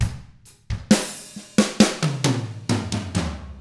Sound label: SLOW ROCK, Drums; ILIO; Double Platinum Drums 1